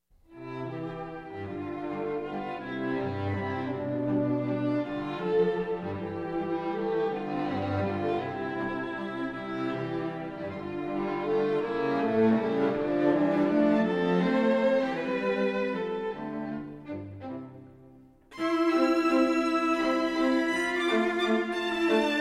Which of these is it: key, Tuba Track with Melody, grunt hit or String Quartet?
String Quartet